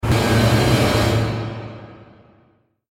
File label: Nightmare Jumpscare